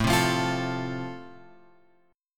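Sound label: A Minor Sharp 5th, chord strum; Am#5 Chord {5 3 3 X 6 5} strummed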